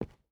footstep rubber boots concrete walk; Rust

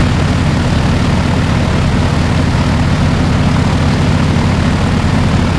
blenheim rear, engines; War Thunder

